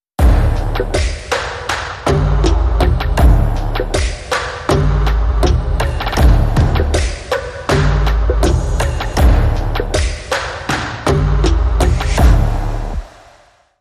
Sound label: Dynastic Drums Bumper